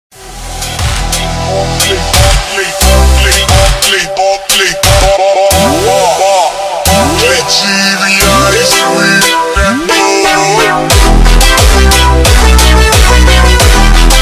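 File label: slowed, phonk music; funk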